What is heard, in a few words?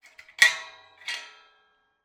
Iron gate, latch, sliding, rotating, lock, clang